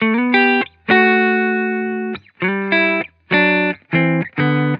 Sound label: Vibrations Pop Guitar Loop 100 BPM G Maj; Cymatics; Vibrations Guitar Sample Pack